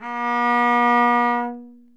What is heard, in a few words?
cello